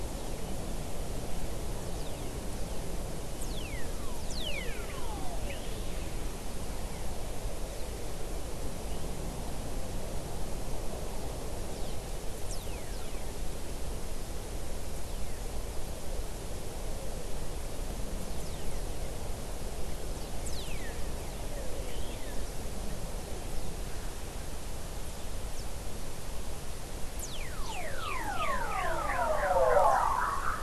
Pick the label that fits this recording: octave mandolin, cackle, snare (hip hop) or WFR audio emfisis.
WFR audio emfisis